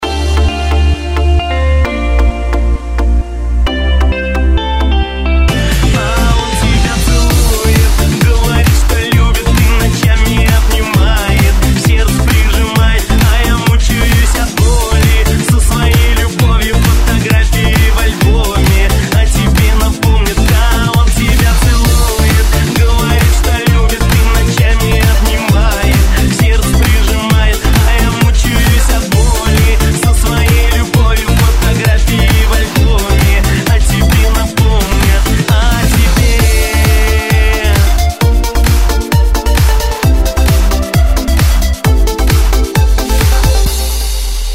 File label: beat remix